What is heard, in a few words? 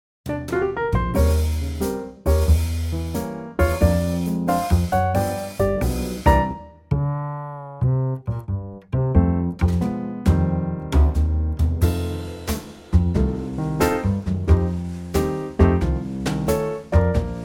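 Bb Trio, backing track; key - Bb - vocal range - A to C; Here's a super old ragtime gem in a great Trio arrangement.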